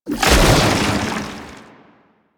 Tank Infested Melee Charge; Enemies; Abilities; Warframe; Lasrian

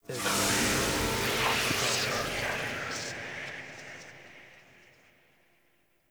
Puzzle Mural Hint; Puzzles; Circade

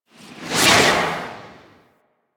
discharge start; Enemies; Warframe; Infested; ZealoidPrelate

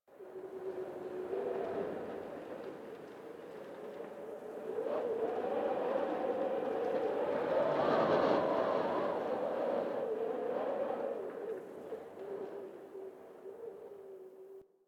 Landscape Cave Wind Howl, Ambience; Warframe; Venus Open World; One Offs

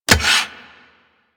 Hammer Equip A, Foley; Warframe; Weapons

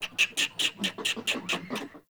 Forest Animal Close; Ambience

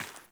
Sandals Run Rock, Footsteps

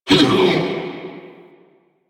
Bash Cast Vocal; Warframe; Hoplite

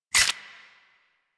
Akimbo Pistol Mech; Grineer

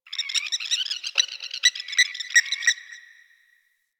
Night Tree Critters; Warframe; Ambience; Eidolon Plains; One Offs

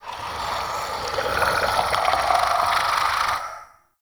Mockery Male Vocal Idle; Characters; Enemy; Vocals; Mockeries

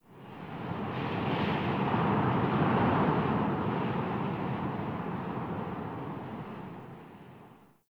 Wind Heavy Fast Gust; Ambience; Dungeons; Under City

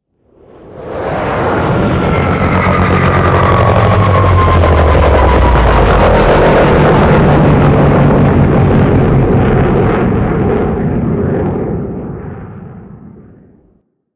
Drop Ship Arrive; Warframe; Vehicles; Grineer Drop Ship

